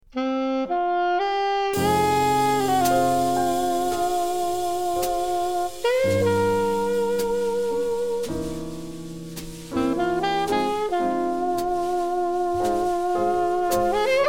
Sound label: minus bass